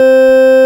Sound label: ORG Farfisa Clarinet C 4; VIP Clr2